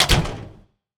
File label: close